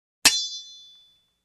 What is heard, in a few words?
Sword blow; cold weapons